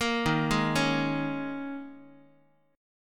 D sharp 7th Suspended 4th D sharp, Guitar; arpeggiated; x,x,1,1,0,0; 4A,4E,5A,5D,5G#,6C#; JGuitar

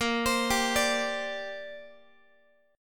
A sharp 6th Add 9th, Guitar; A#6add9 arpeggiated

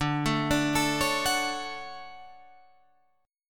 D Major 7th, Guitar, Drop D

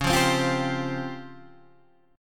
Db Major 7th Flat 5th, Guitar, Half Step Down

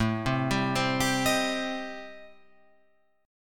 A-Minor 7th Flat 5th, Guitar Open F, chord; arpeggiated; JGuitar

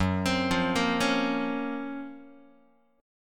Gb Major Sharp 11th Gb Guitar; GbM#11 arpeggiated

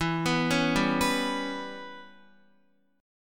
E Minor 6th E, Guitar; Em6; arpeggiated; x,7,9,6,7,6

